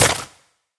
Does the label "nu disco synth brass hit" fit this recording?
No